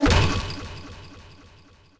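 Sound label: ricochet hit modules, tanks; killscene; War Thunder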